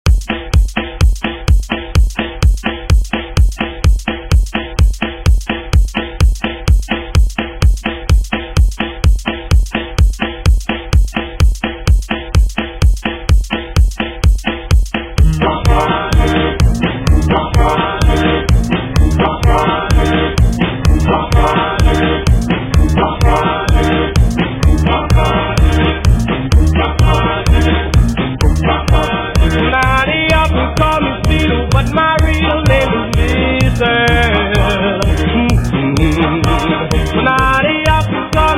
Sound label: 50s Grid Redrum 127Bpm